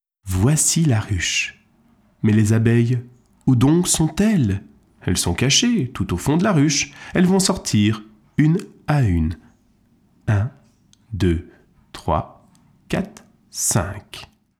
Voici la ruche, jeux de doigts, kids

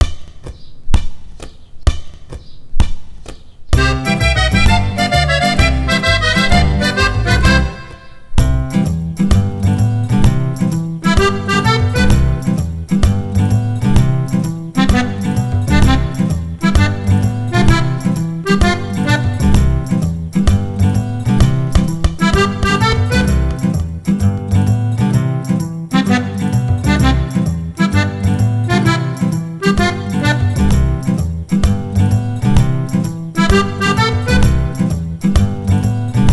Instrumental

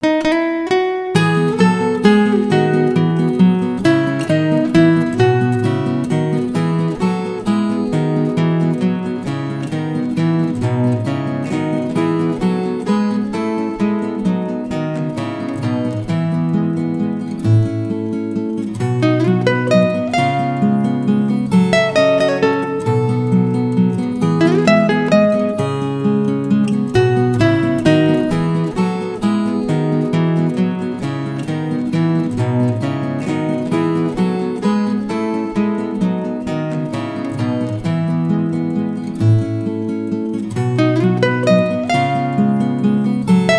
Instrumental

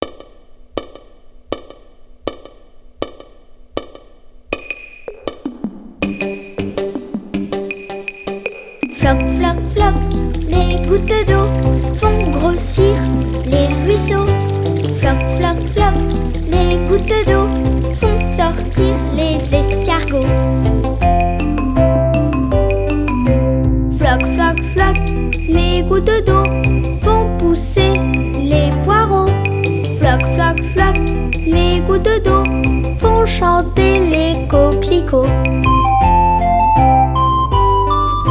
Les gouttes d'eau (Chanson)

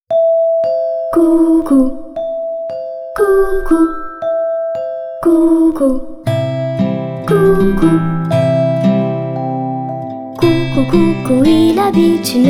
Coucou, Chanson

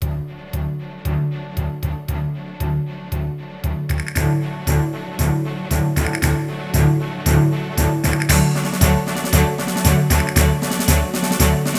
Chanson

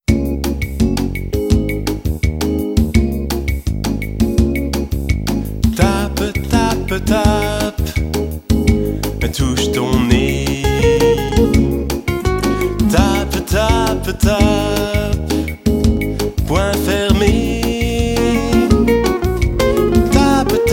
Tape, tape, tape, jeux de doigts, kids

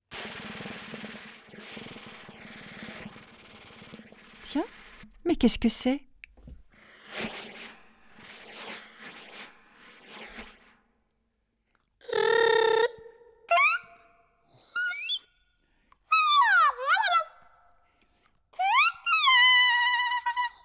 Le saxophone (Introduction)

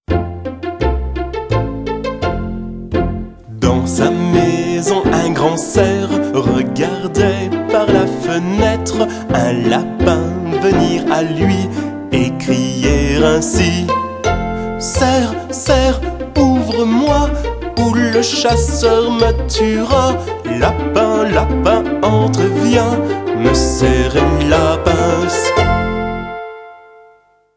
Un grand cerf, jeux de doigts, kids

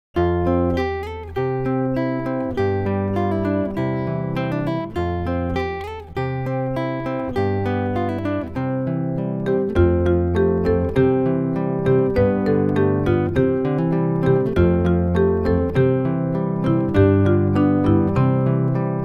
Hôpital des Marmottes (Instrumental)

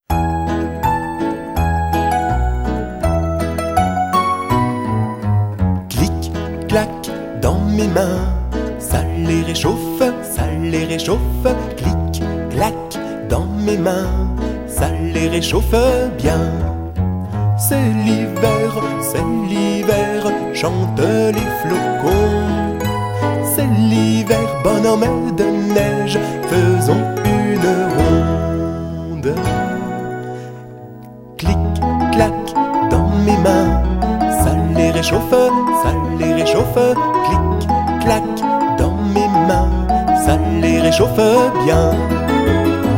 Clic clac dans mes mains

